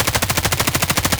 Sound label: m4 fire silenced loop; Escape from Tarkov